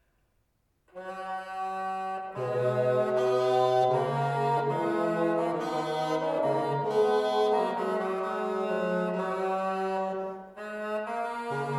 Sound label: Bassanelli, Tenor-Bassanello; Tenor-Bassanello Tonumfang: c – a'; Bass-Bassanello Tonumfang: G – d'; Tenor- und Bass-Bassanello: Ach meydlein rein; Hersteller: Helmut Bartschies; Anmühtig und wol zu hören